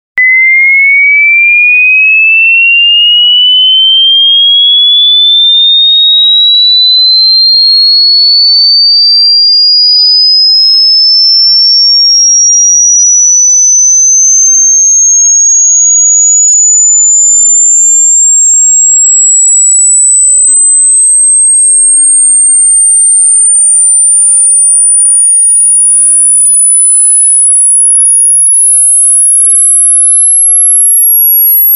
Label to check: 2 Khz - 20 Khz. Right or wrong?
Right